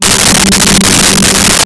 Choir Oohs G3; Nintendo; July 2020 Gigaleak

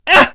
slide; PLAYER VOICE; Nintendo; July 2020 Gigaleak

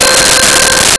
Metalic Pad C5; Nintendo; July 2020 Gigaleak

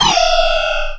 shot repel; Player SE; Nintendo; July 2020 Gigaleak

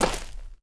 dirt run, footsteps; chief

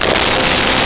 Tuba C3; Nintendo; July 2020 Gigaleak

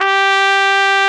Trumpet, g4; BGM; Nintendo; July 2020 Gigaleak

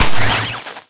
plasma hit; plasma weap

